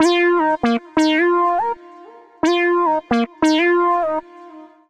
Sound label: Bb Minor 89bpm; Evening Casual